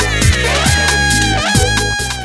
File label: cow